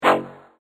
Laser Longbow, laser gun, science fiction sound effect